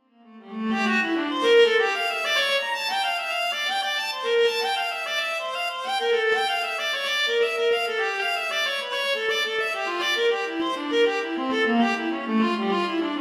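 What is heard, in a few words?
violin solo; presto; j s bach; vl solo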